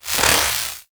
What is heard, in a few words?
Power Rift Arm Movement; Gameplay; Warframe; Zariman